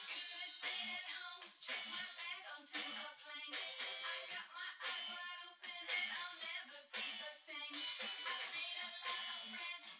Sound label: wdrums synthconv